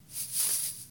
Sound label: Chain Rustle